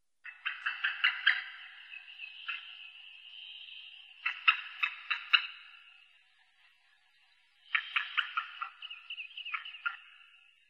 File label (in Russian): orlan belohvost golos